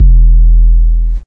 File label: gentle blue monster bass; Basses